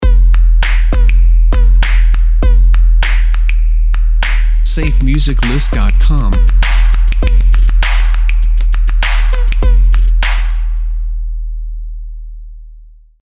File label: Bass And Claps Urban Logo; SafeMusicList